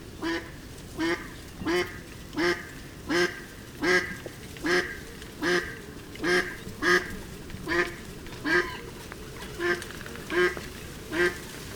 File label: Mallard